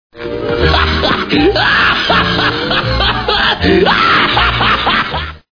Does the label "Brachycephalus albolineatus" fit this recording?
No